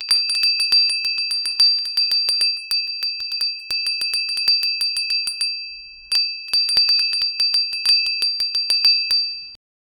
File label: short mechanical bell rin